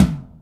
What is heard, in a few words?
DRUM TOM, SUPER FUNK; Pro-Rec - Synthsonic